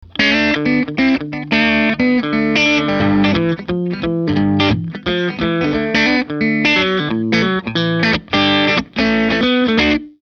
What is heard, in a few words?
Vintage Plus Shuffle; Tonerider